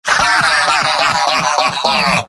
laugh happy; demoman; video games; source engine; tf; mvm

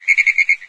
frog long, frog groups; ambient; random animals; Left 4 Dead 2; Source Engine

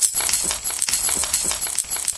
dispenser bullet chain; tf

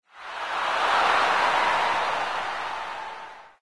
baseball exit windows; sound schemes

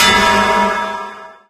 killsound

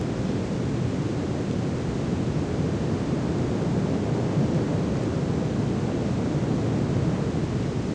wind elevated area; Source Engine; tf; lair

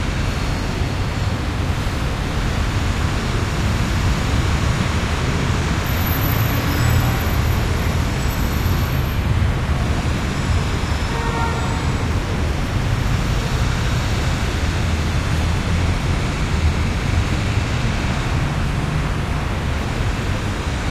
warehouse city, ambient; Source Engine; tf; mvm warehouse

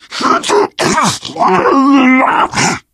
charger lurk, voice idle

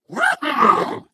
ballbot jump short

